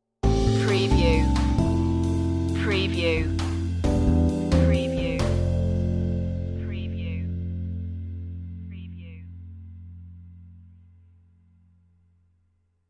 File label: jazzy idents